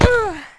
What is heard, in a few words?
Bat Hit; PC Gamer IT CD 49; UrbanChaos